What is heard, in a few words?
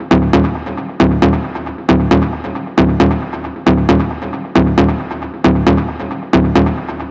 Synth Loop D 135, Techno; Ghosthack; AC2024 - Day 1 - Techno Sounds 2025